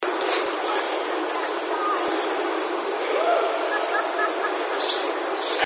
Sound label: Whoop